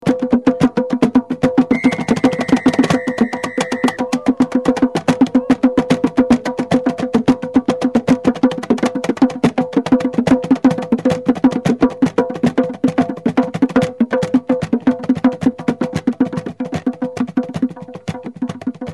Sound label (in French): espagne salamanque percus sous le pont d'avignon